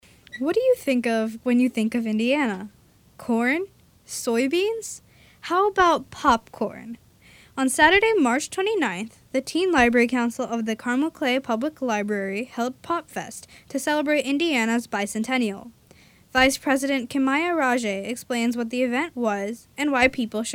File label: BEAT